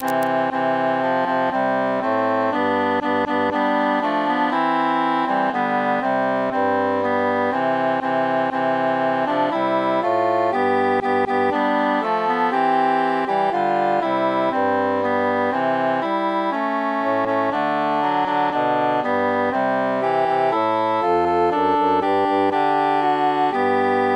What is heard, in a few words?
cantus mute; since first i saw